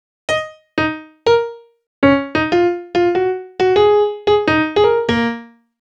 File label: Piano Solo, Cinematic Funk; Sony Creative Software; Cinematic Funk Urban Tone Poems